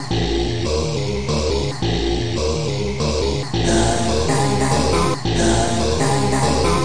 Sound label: 140 odd breathy bassline; VOCAL OR VOXY LOOPS